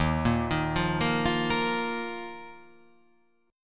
D sharp Suspended 2nd

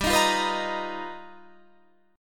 G 7th Sharp 9th Sharp 5th, G, guitar chord; G7#9#5 strummed; JGuitar

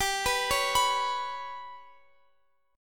G Major Flat 5th G down Guitar Open F; GMb5; arpeggiated

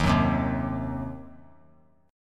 Eb Minor 6th chord; strummed

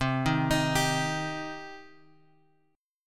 C Minor; Cm Chord {X 3 1 X 4 3} arpeggiated

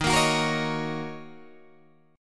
D sharp Minor 7th, Guitar, DADGAD

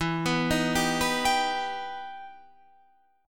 E Minor Major 7th E down; EmM7; arpeggiated

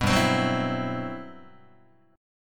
G Major 13th, Guitar; strummed; JGuitar

